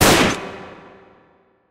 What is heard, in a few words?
machine; Zombies Night